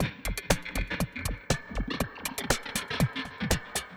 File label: Tech Break, Beats; Synchro Funk; Plumpy; Sonic Foundry (Sony Creative Software)